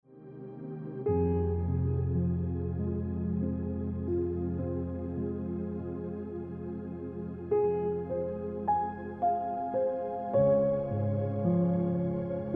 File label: Piano Music